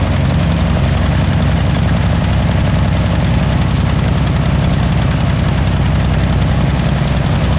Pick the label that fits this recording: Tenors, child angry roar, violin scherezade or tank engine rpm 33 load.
tank engine rpm 33 load